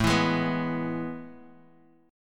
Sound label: A Major 7th Suspended 2nd, Guitar, Half Step Down; AM7sus2